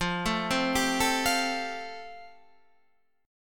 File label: F Major Add 9th, Guitar Standard; Fadd9 arpeggiated; JGuitar